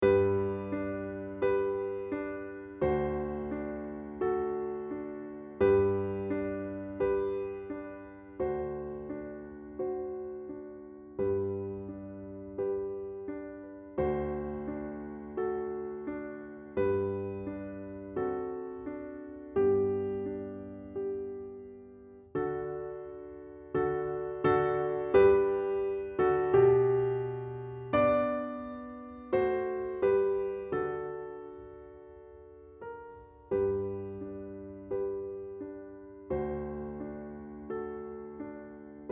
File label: Accompaniment; Cradle Song; ViolinSchool